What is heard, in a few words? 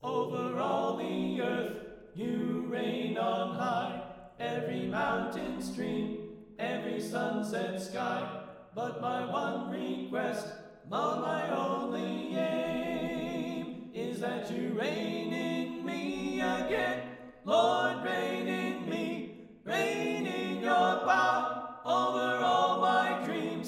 Alto Left